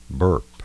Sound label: burp